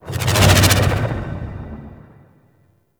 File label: Invisibility Enter; Warframe; Loki